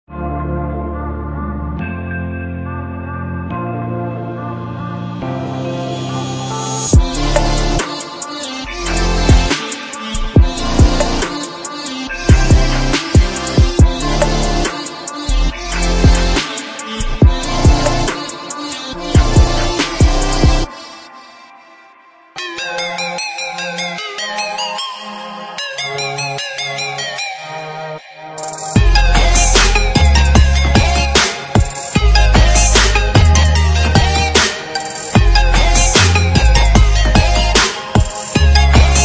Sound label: Nightlife Trap Pop Construction Kits; Big Fish Audio